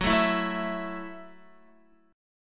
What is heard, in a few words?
Gb Minor 7th, Guitar; strummed; JGuitar